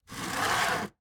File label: Window Frame Open